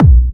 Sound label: Trance Kicks